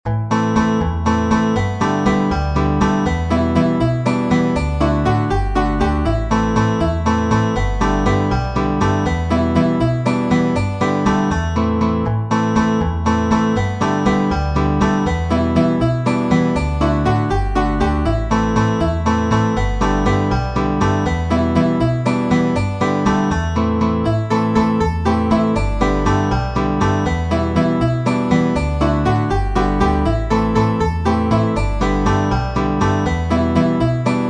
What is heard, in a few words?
Traditional jig, Irish banjo; The Lilting Banshee